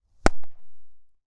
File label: sport baseball catch glove; martinimeniscus